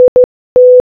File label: IT Morse Code Audio